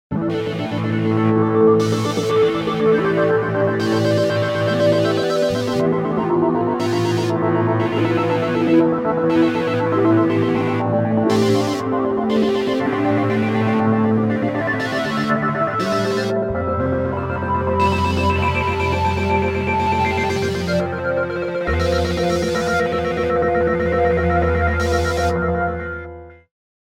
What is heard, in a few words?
galactic phone; Constellation M42